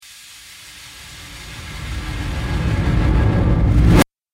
Trailer Riser Abrupt End, Rise And Dive Sound Effects